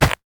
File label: basic attack; LoL; ashe; bowmaster